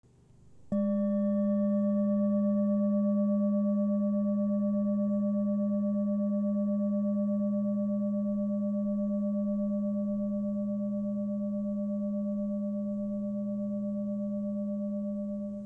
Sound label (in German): erleuchteter Buddha